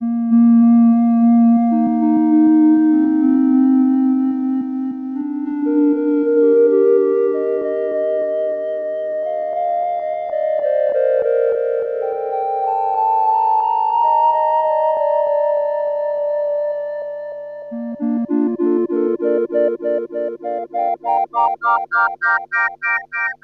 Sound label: Tuning